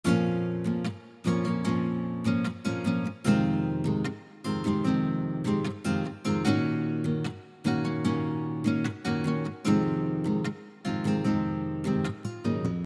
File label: Sixteen Bars 2 Octave Nylon Fingered, real guitar